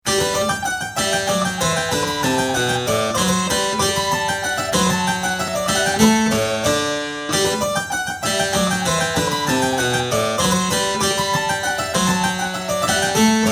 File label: Harpsi, Harpsichord; Live Performance Samples: single string, double string Note release samples; Classic; Vivaldi; 3D position: 6 feet away